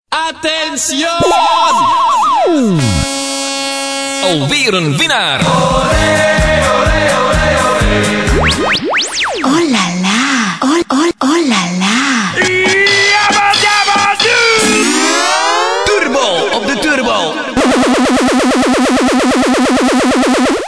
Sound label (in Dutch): kermis telefoon ringtone